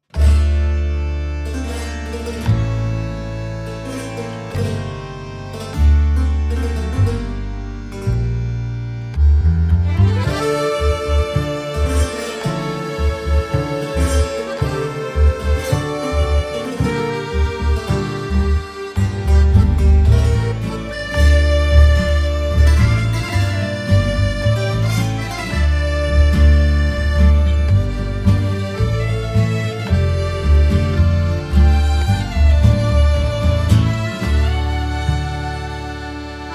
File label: tango